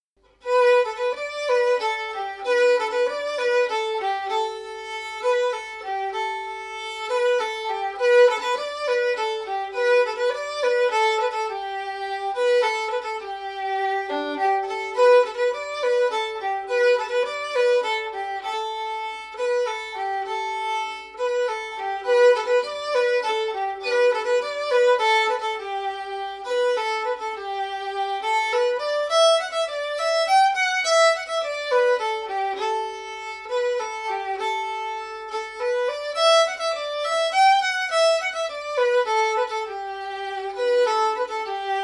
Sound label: Fiddle; Eagles Whistle